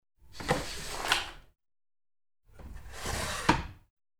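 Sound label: drawer of nightstand, desk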